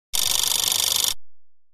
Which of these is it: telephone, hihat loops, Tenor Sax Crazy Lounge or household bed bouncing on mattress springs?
telephone